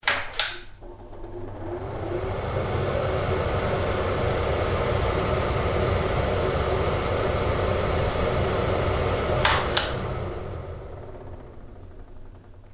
Extractor Fan, Pull chord electric extractor fan on/off; Air Conditioners; Household